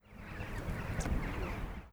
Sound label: large pipit; Sennen